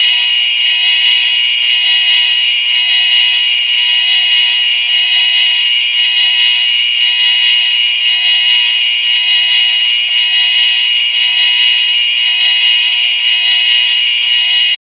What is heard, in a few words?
light alarm sound